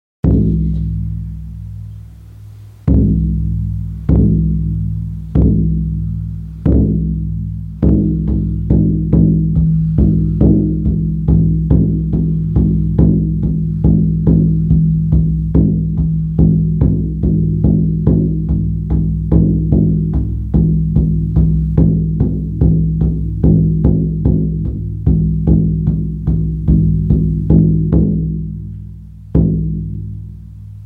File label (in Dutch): paard, sjamanendrums